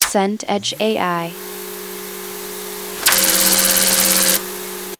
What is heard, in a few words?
printer laser warmup